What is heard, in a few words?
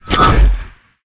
Pickups; unreal development kit